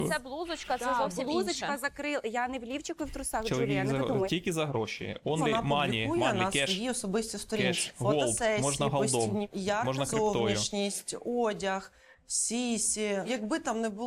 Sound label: video vocals